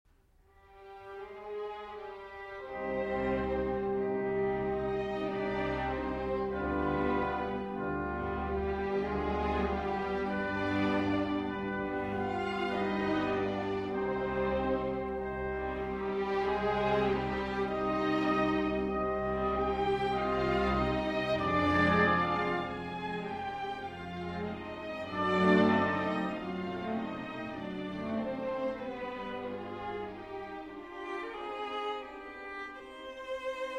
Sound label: Sinfonia Concertante in E-Flat Major for Violin, Viola